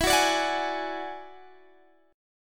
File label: F sharp 7th Suspended 2nd Sharp 5th, E